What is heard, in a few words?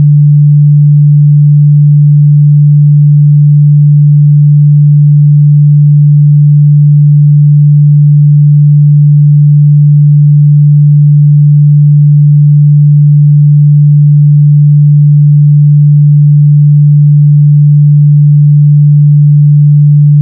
Guitar 146.832, Сигнал 146.83 Герц (D) для настройки гитары